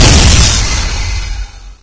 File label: attack shoot, vort